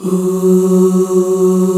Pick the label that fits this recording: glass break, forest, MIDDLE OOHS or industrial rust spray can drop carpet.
MIDDLE OOHS